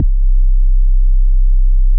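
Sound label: Sub bass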